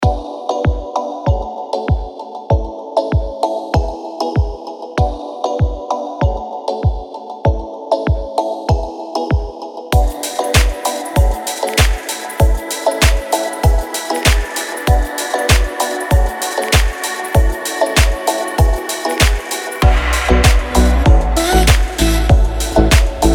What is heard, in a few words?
tropical fashion beat